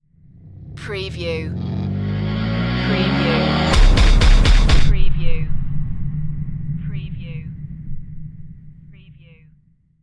man machine stinger

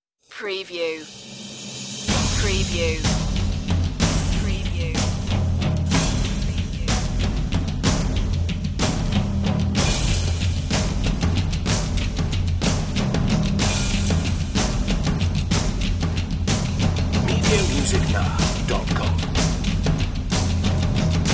percussion only version

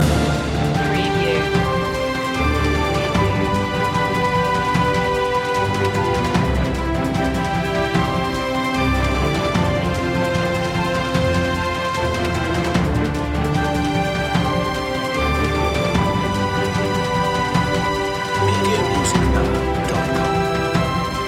somewhere on this planet loop alt perc; media music now

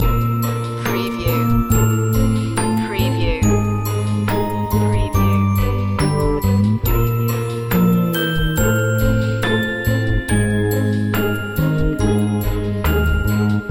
lullaby beat loop

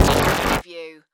Hit Digital; Production Element; Sound Effects Bible

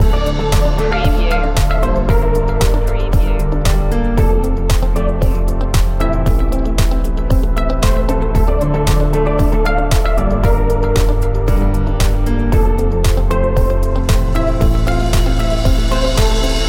corporate awareness loop

